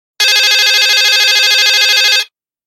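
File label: original ringtones nokia 5310 xpressmusic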